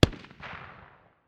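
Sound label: light machine gun far distance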